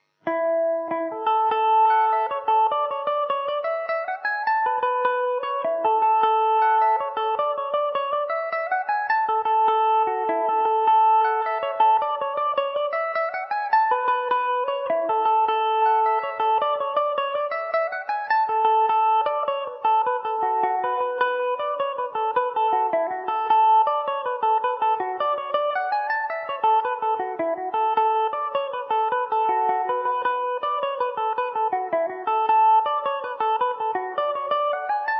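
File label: A major, Irish mandolin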